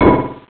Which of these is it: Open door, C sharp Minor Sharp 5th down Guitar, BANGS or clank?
clank